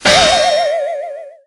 prince charge hit

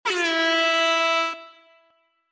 sfx airhorn; Discord

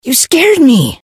gus hurt

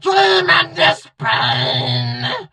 hurt

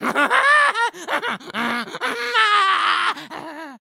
laugh; 吉格斯（英雄联盟）; 爆破鬼才

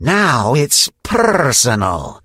hurt vo; kit

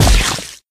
flea egg land

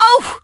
hurt

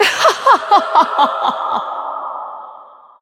laugh

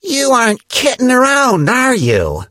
hurt vo

